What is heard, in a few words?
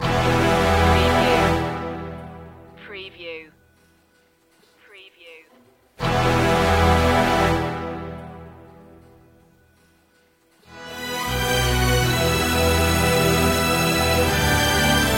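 no choir percussion synth or guitar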